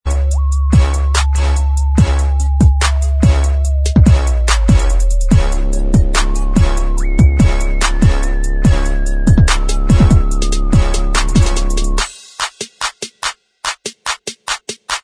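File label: instrumental, backing track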